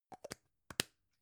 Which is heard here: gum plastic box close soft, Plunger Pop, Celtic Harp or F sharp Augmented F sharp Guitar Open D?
gum plastic box close soft